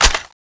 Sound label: box in; cso; skull6